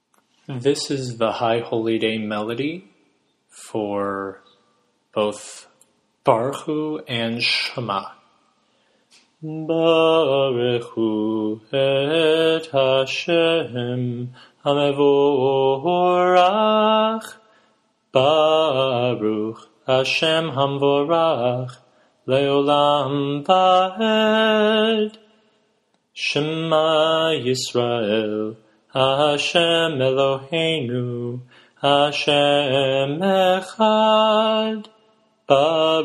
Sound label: Barkhu Shema